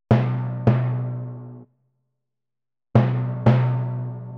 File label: 85 timpani, Orchestral arrangements, 85 B min